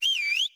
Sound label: whistle only; hth whistle only 160122